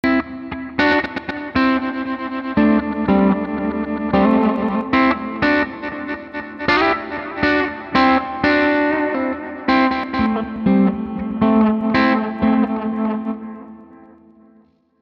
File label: Stereo Jets